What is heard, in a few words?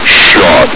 shot; Source Engine; bshift; hgrunt